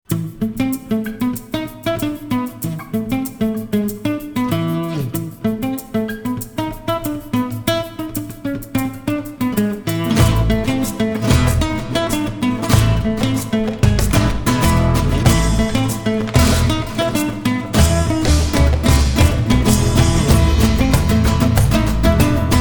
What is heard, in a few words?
Gtr Samba; Jane the Virgin